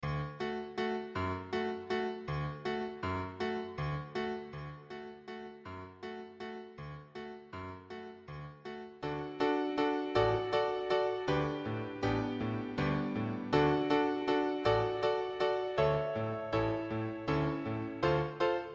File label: piano 80